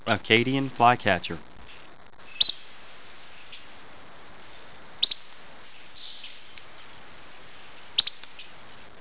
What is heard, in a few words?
FLYCAT CL, Birds, Coastal Louisiana; Explore Coastal Louisiana with Boudreaux